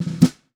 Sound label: Snares; Birch; Ghosthack